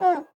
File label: dog, sad